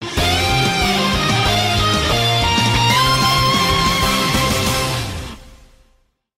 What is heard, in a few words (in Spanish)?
Tema de victoria; Pyra-Mythra; SSBU